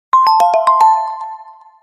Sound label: Vivo X20 Plus Notification Ringtone; ringtones